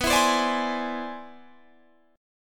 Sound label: C Augmented Major 9th B